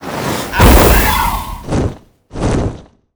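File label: Boss Phoenix spawn, monster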